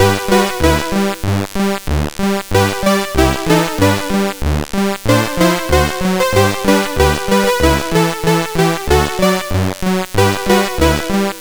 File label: Jingle Bells, MOS6581; MOS6581R4